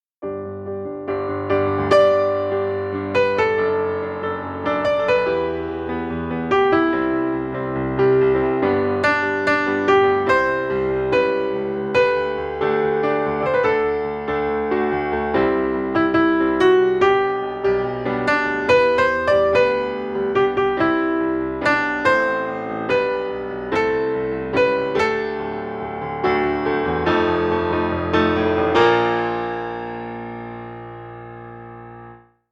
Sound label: Melody; Nala; FOLK